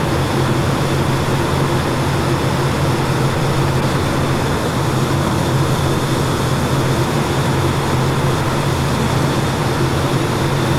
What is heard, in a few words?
MiG-29 Cockpit Plane Wind In L; DCS